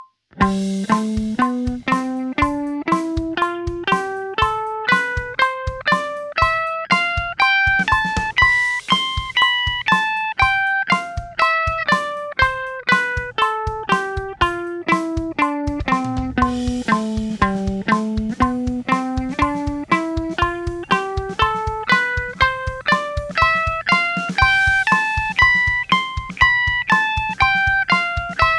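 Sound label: A minor #7 Mixolydian octave 120 bpm quarter, guitar; a minor diatonics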